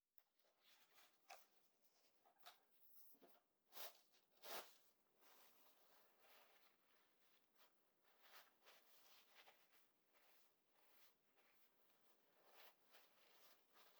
股間をティッシュで拭く; アダルト系

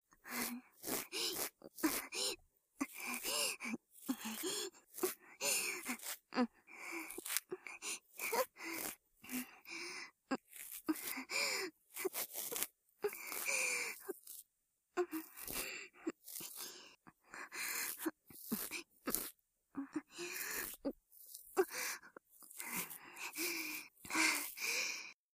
耳かき, ASMR; ループ耳かき（通常左版）

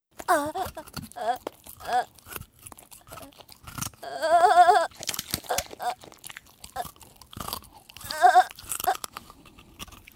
喰い破る

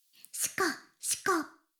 シコシコ音声, ASMR; 古き城のドラゴニート2

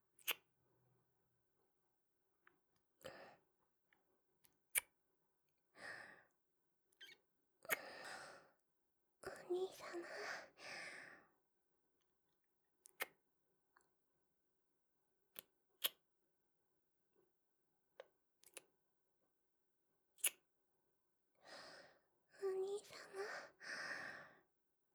キス; リリア

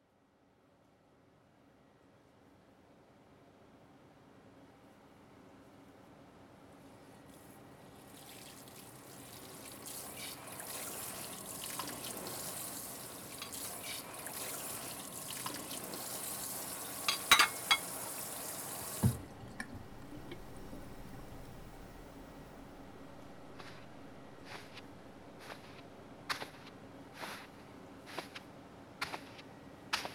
顔と首の水星マッサージ、まる。（吐息） ASMR

